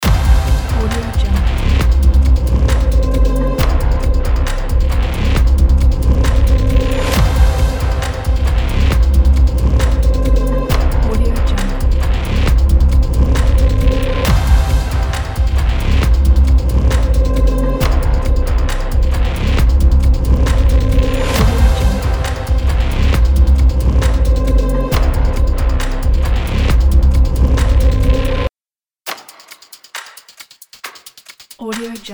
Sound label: dark action menu background loop